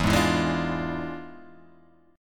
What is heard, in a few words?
E 7th Flat 9th Guitar Open G, chord